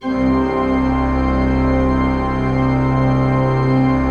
C1, cathedral organ; Propeller Island

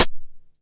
909 HH Tape Sat; Goldbaby Tape808 & Tape909; The Tape 909

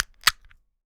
CAN OPEN, KITCHEN; AKAI S6000 CD-ROM - Volume 6; Daily Life

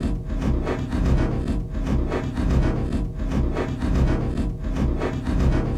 Vam Wak, Frame Kendang; Sonic Foundry (Sony Creative Software) - Friendly Fire; Drums

